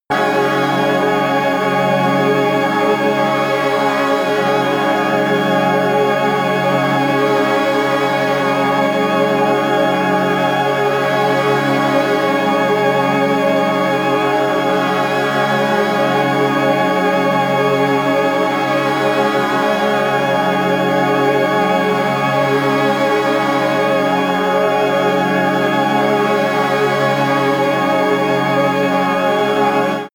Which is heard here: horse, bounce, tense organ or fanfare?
tense organ